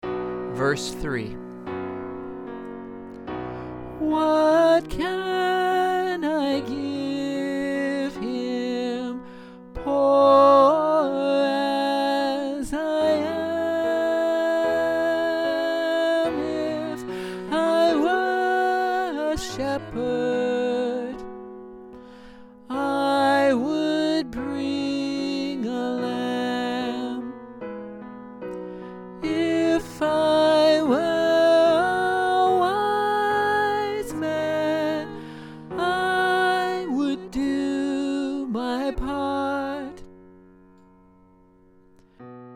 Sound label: Alto; In the Bleak Midwinter